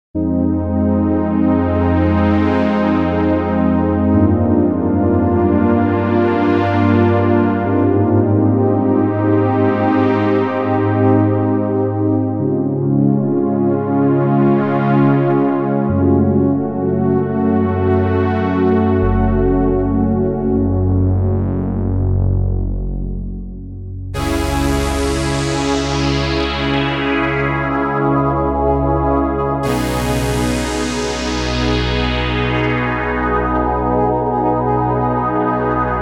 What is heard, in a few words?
SQ80 near analogue